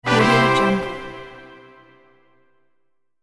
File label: fanfare ta da